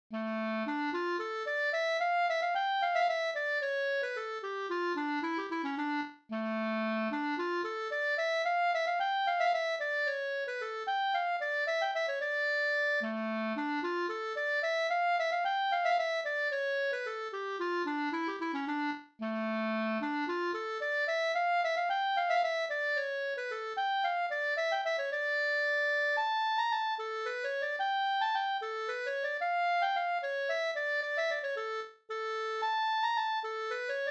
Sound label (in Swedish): polska brudpolska